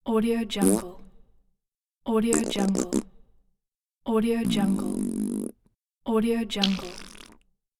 fart sound

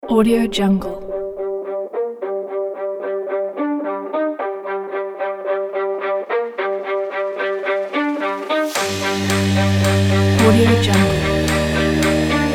sport and dramatic aggressive big beat

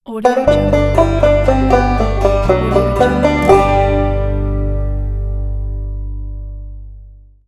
banjo logo

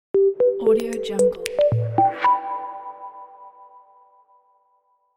fresh logo; secondsquaremusic

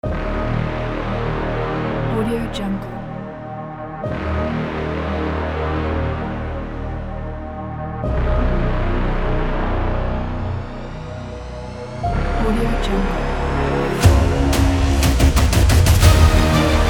impact teaser

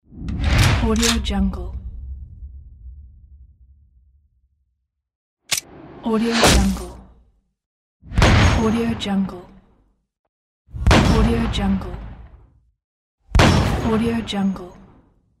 gunshot

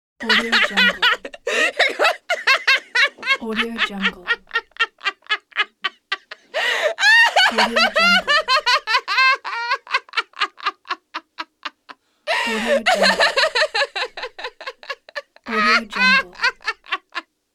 young girl laughing

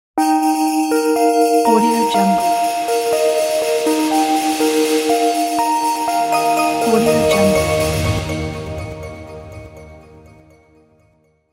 christmas logo

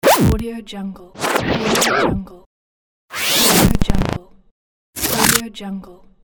scratch

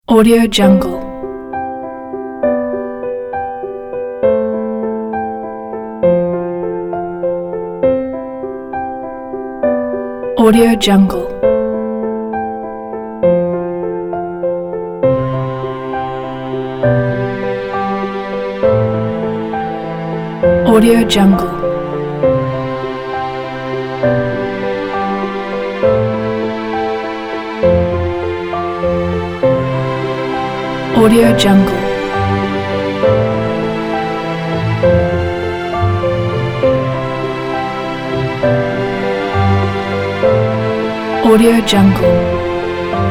emotional piano trailer; music-art-production

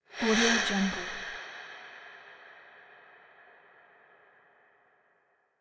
female ghostly inhale

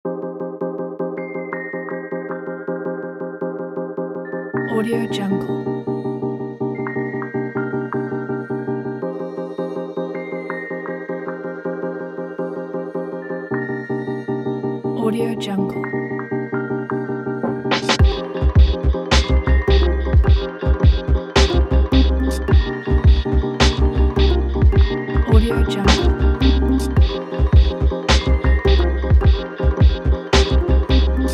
electronic chillhop; marblespace

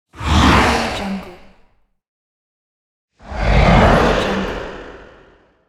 dragon roar

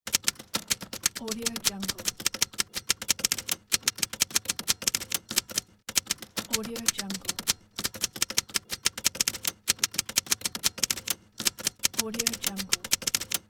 typewriter

